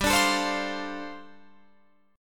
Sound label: G 9th Suspended 4th, Guitar Drop D